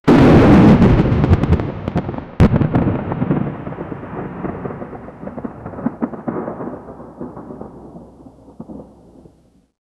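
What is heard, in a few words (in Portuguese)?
trovão massivo e caótico, storm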